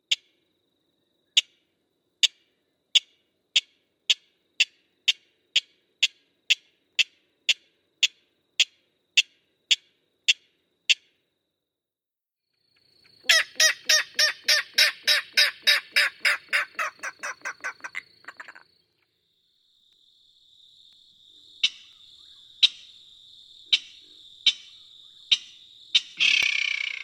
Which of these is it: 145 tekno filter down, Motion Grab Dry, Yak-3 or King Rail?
King Rail